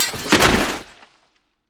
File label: emote death; rengar